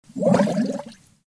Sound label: Die Water Sound